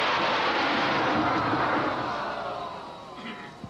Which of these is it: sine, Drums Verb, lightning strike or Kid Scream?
lightning strike